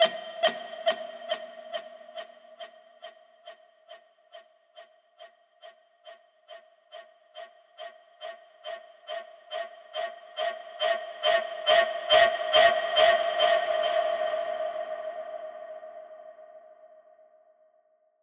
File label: Initiate, Beeps; SFX